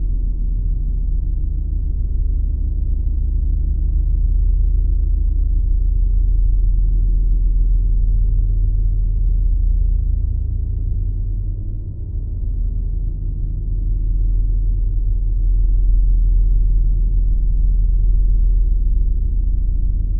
Drone Power Source Deep Low Pulsing; Production Element Imaging; AdobeCC

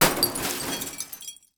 Crash Glass Break On Metal With Debris; Crashes

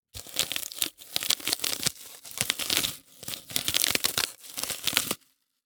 Horror Scary Bone Chop

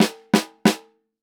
Cartoon Drum Snare Tap; AdobeCC

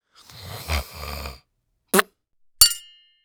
Human Male Spits Into Metal Bucket

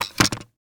Technology Electronic RCA CD Player Opening Fast

